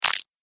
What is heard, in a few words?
Laser Squelch, Laser Sound Sound Effects